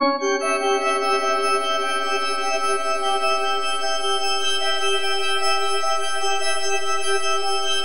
FM C# DIM; Bolder Sounds

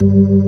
ORG, Organs

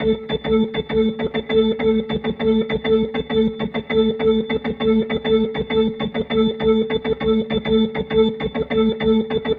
Rotor Riff, Organ; Sonic Foundry (Sony Creative Software); Joe Vitale Organ Donor

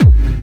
hard bass drumz

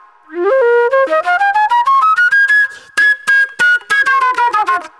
NY 70's Jazz Flute

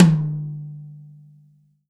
tom, Real Drum Kit, funk kit

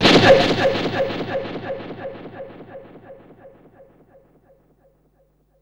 FX, Drum & Bass

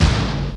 DRUM; Crypton - DrumBase5000; GATE KITS

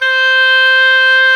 C5 SFT VIB, OBOE; Northstar - New Gold VOL-2

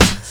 SD, HIP, Dance Mega Drums

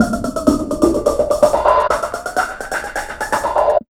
126 PERC, PERC LOOP, Fresh Disco House; USB Soundscan vol.20 - Fresh Disco House I

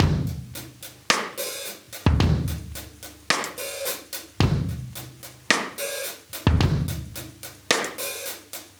109 live breaks

